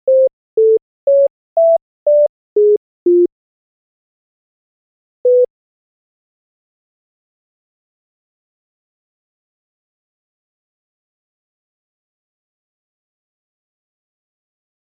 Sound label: int tones